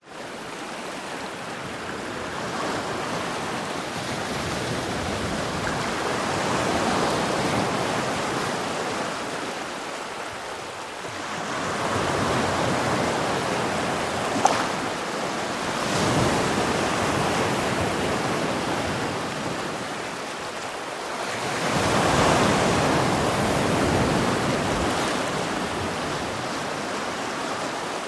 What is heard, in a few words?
beach rolling waves gentle black sand water distant birds; Alexander Gastrell